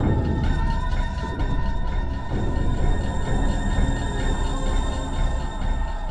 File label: sounds music; Halo Combat Evolved; Xbox